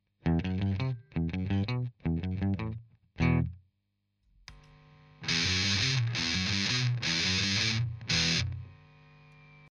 Bridge Sustain

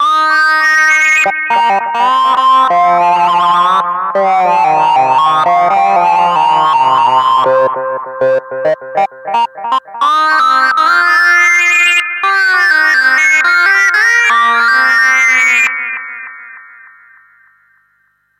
SyncLead; Harley Benton D Seed

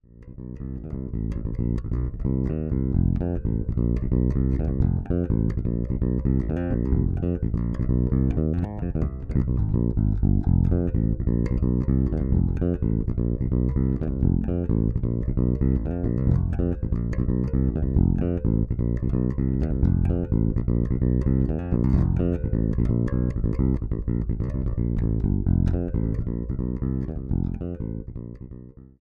Radial J48 Fodera Monarch

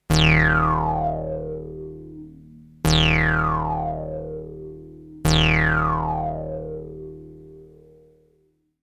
Behringer CAT Sync

